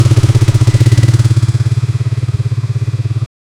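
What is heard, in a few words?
starting royal enfield motorcycle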